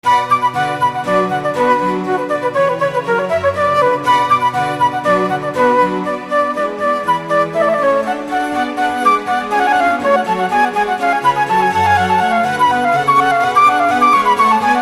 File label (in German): Floete, Bach